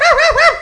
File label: Yapp